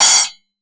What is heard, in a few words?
Zildjian cym 12